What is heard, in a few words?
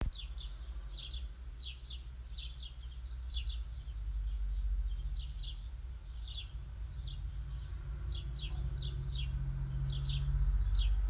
house sparrow